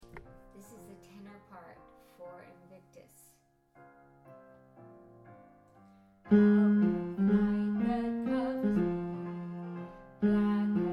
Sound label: tenor; invictus